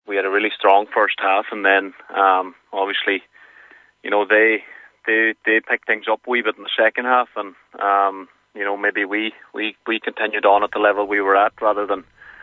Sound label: HARPS